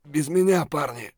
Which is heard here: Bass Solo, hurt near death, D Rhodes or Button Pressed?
hurt near death